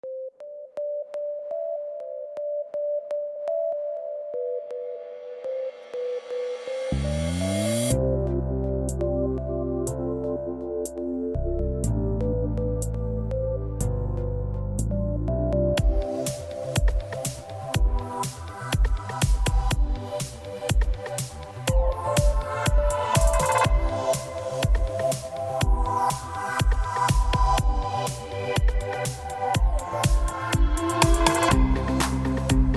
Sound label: Disco Track